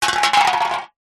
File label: food drink can soda empty knock over wood floor